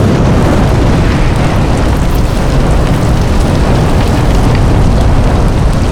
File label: fireball loop